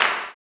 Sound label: CLAP, blues & soul; ILIO; Vocal Planet